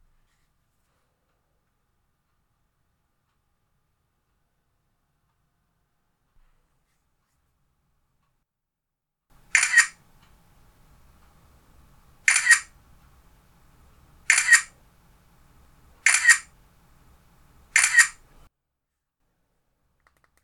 Iphone6 Camera Taking Pic Shutter Sounds

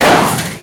Trophaser

